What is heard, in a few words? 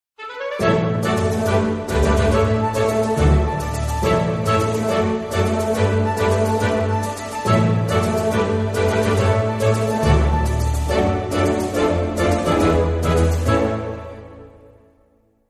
Camp Cleared; asterix obelix xxl3